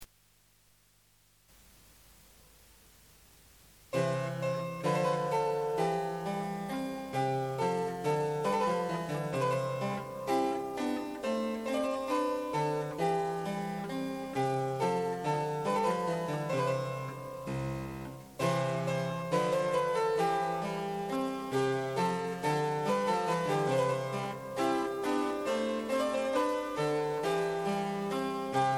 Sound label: harp; H. Purcell: Rigadoon (Cembalo)